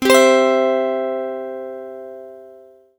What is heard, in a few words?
C chord